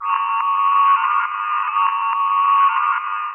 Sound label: loop